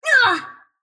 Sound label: sylph pain; dota; dark willow